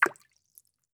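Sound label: bullet case bounce 308 water; gmod; viper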